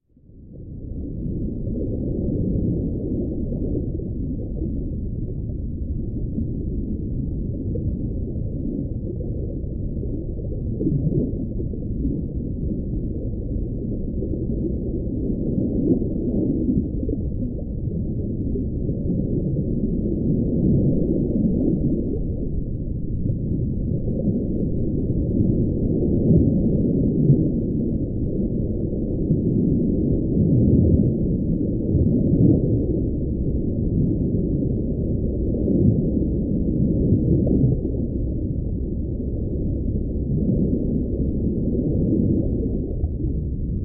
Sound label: Underwater Ambience Deep Sea - Underwater Waves And Bubbles; Hollywood Edge; sound designer's toolkit v2